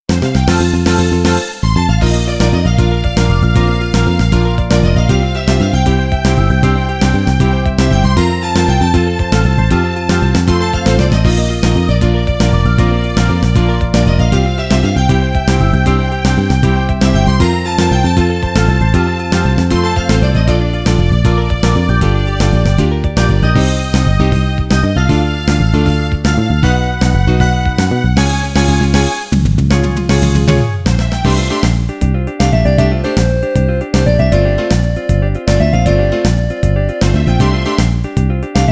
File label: Practice Game - Victory; Doki Doki Pretty League Lovely Star